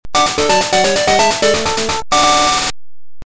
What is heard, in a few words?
level clear; tiltandtumble